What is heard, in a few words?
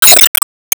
Enemy Shield Mini Guard Break